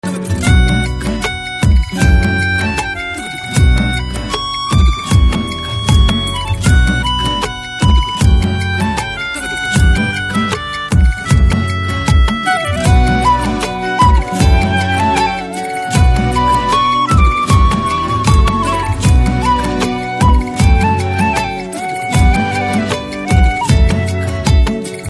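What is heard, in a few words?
background Tone Telugu Ringtone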